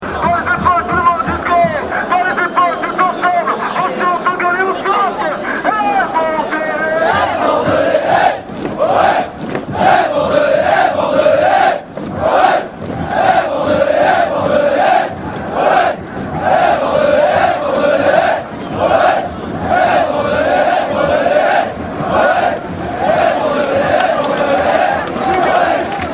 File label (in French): Eh Bordelais Eh Bordelais Eh, Chants